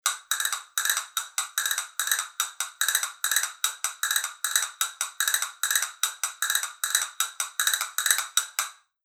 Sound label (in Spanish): castañuelas granadillo consol